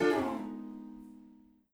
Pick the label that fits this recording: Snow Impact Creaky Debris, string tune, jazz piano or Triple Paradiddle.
string tune